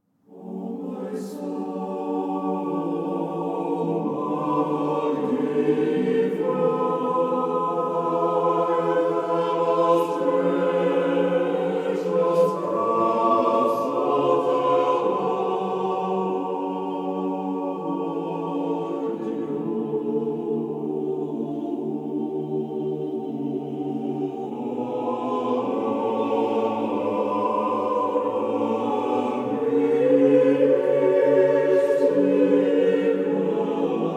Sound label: Irmos of the Ninth Ode for the Exaltation of the Cross (Tone 8); Roman Hurko; arr. R. Hurko